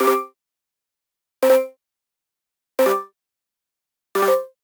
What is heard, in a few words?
Digital Click Negative; boom library; The Interface